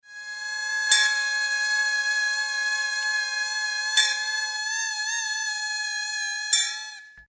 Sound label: membranophone